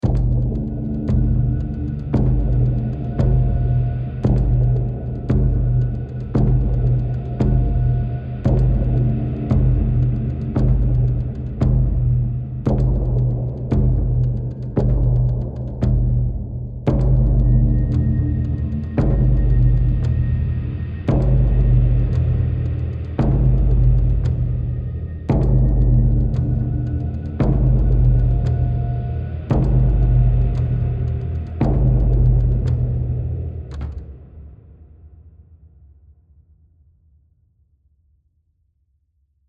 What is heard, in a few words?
Tension High Chunk; The Last of Us